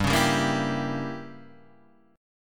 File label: G Diminished 7th G down Guitar Half Step Down